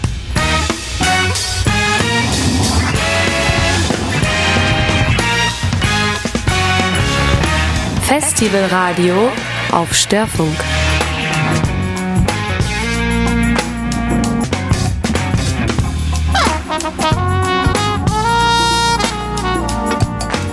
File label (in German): jingle festival radio